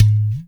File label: UDU; Spectrasonics; Heart of Africa